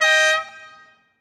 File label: Lamborghini Horn; Automobiles